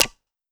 Charge On

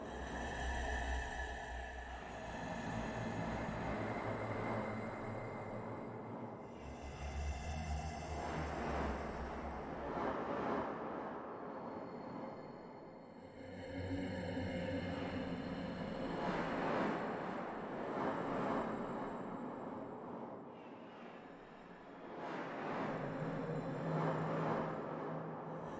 Cursed Skull Loop

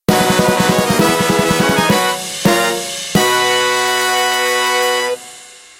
Jingle Clear; magicians saga; android ios

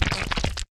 Grenade Jello Stuck

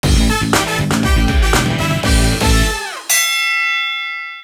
Boxing - Speed Bag - Intro; Wii Sports Club

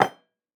Mug

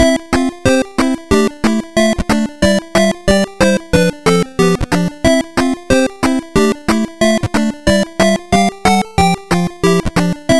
Video Game BGM Clear; Tanuki Pon's Summer Demo

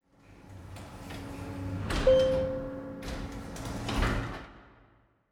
Elevator Spawn

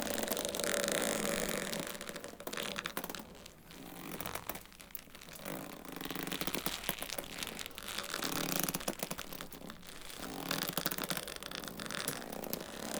Rope Loop

